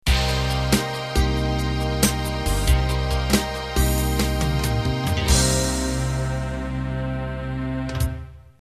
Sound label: Slow Rock End